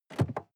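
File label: sports car interior seat handling medium; verkehr transport; autos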